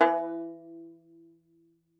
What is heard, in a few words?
Tenor Banjo, Plucked Strings; E-MU Producer Series Vol. 7 – Old World Instruments